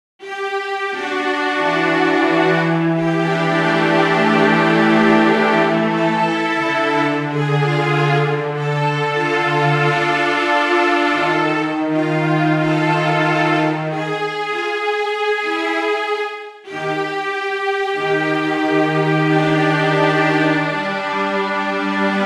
Cello

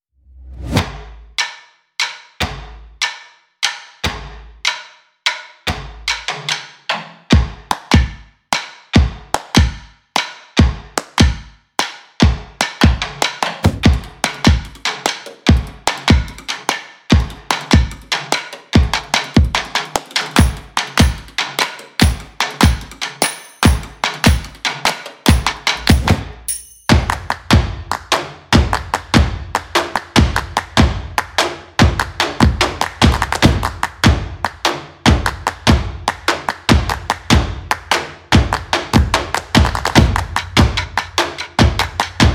Percussion Energetic sticks claps snaps

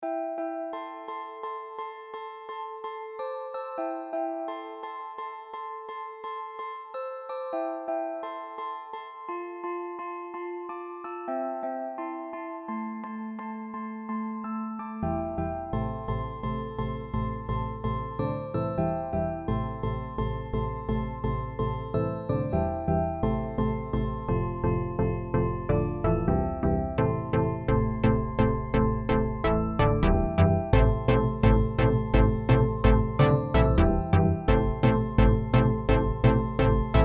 Glockenspiel Deep House Mix

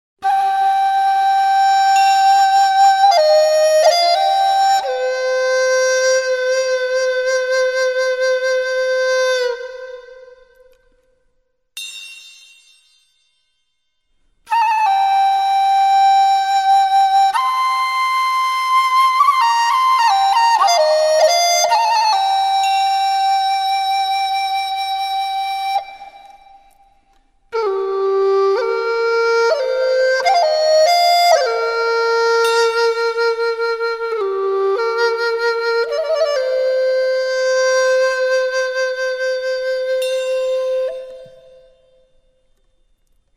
Interlude from Asian Drums

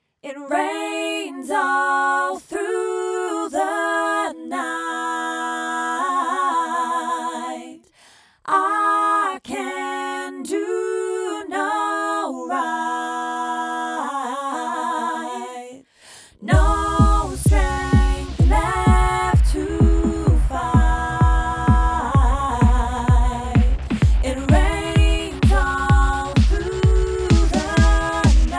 Club EDM Rains Electro Mix; Italy

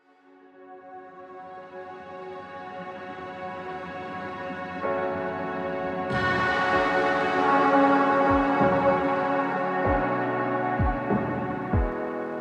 Lo-Fi Downtempo

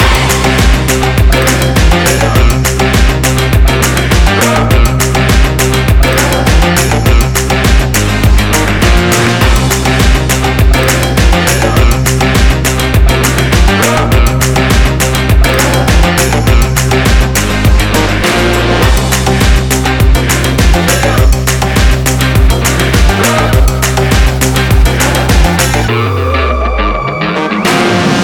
Energetic Fashion Sports Loop; ulbasound

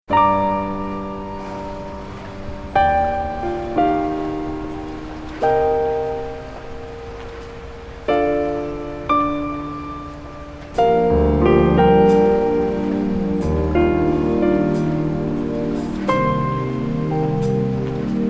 Harp Flow Mix

